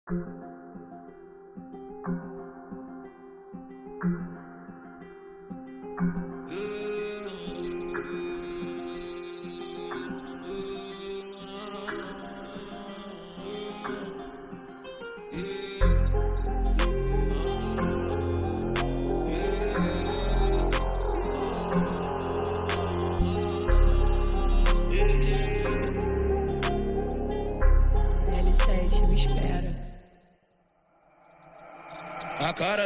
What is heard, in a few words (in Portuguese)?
graves bass